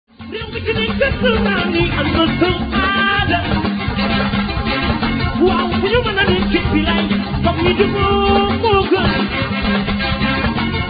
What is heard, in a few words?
diapason